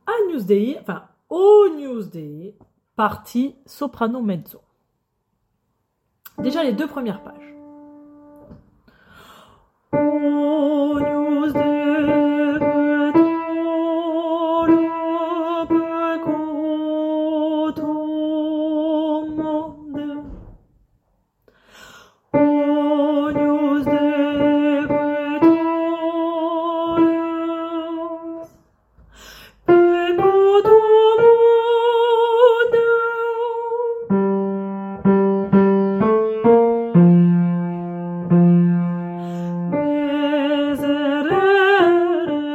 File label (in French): Soprane